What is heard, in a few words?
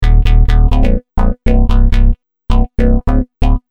Bass Wah, Loops